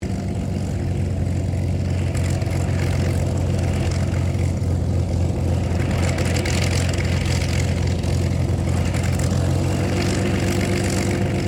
Aircraft P-40 Kittyhawk taxi, WW2 Kittyhawk or Warhawk fighter plane; 1940s; Airplanes; Vehicles